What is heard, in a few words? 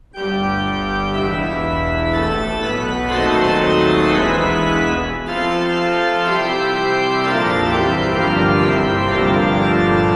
organ with extra bass; Preservice